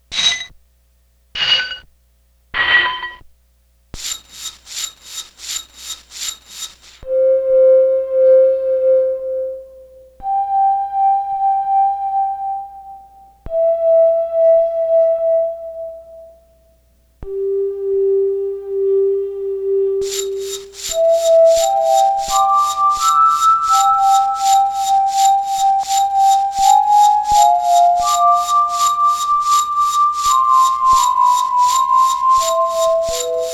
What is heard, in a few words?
Walking Spring Toy Wine Goblet